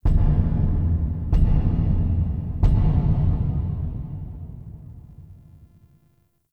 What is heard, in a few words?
Knocks Large; Warframe; Ambience; Entrati